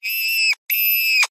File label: science fiction small creature tiny insect scream screech pain